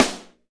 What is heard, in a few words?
SD Real, Roland; Sound & Vision - Gigapack I